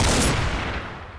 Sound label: shotgun